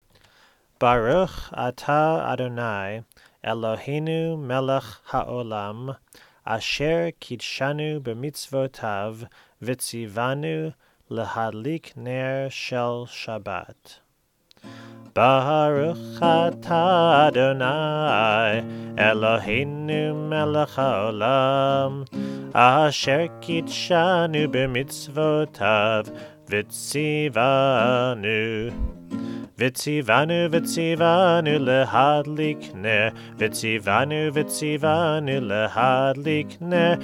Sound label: CANDLE lighting; Shabbat Home Rituals